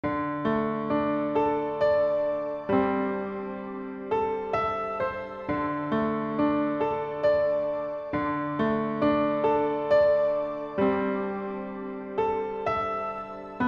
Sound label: Christmas Morn Acc Low